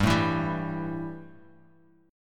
E Minor Double Flat 5th G, guitar

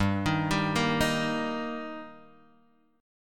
G Minor Sharp 5th G, chord; arpeggiated; JGuitar

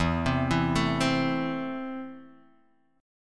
E Suspended 4th Sharp 5th; x,7,10,9,9,7,x,x

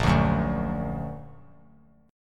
A sharp Augmented; A#+ strummed; JGuitar

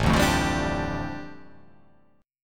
A 7th Suspended 4th Sharp 5th, A, down

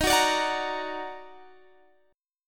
Eb Minor Major 7th Eb down Guitar Standard, chord; EbmM7; strummed; JGuitar

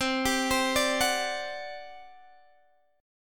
G Major 7th Suspended 4th, C

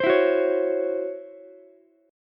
C sharp Minor Major 7th Double Flat 5th Ukulele; C#mM7bb5; strummed; JGuitar

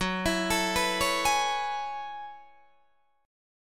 Gb Minor 11th Gb Guitar DADGAD

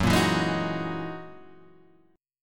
Gb 13th Gb down Guitar Half Step Down

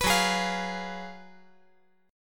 Gb Major 7th Suspended 2nd Suspended 4th, down

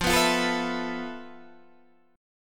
F Major 7th Flat 5th Guitar Standard; strummed; JGuitar

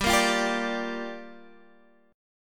G Minor 7th, Guitar, Standard; x,10,8,10,8,10; strummed; capo=3

